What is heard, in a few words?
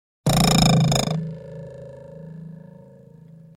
Sword Twang Sword Plucked With Long Ring Out; Fights Bodyfalls